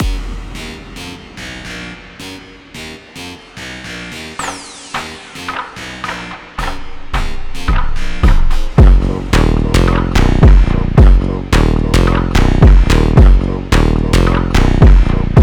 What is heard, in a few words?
Beats De Rap, Base De Rap, Motivation